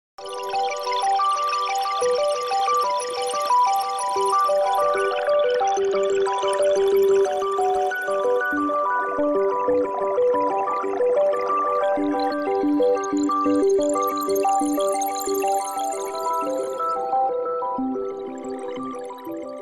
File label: Jingle